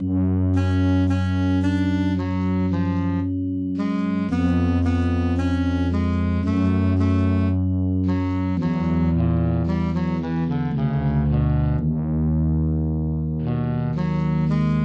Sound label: MIDI